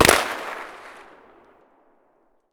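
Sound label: fire far, shotgun; weapons; BOITO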